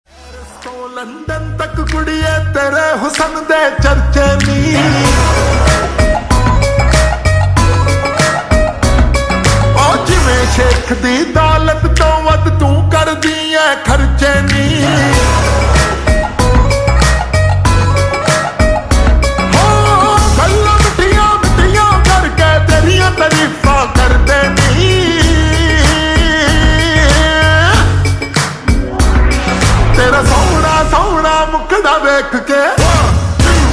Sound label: Slowed Reverb Ringtone